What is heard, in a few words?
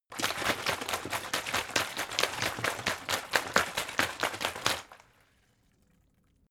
bottle water big shake; gastronomie; berufe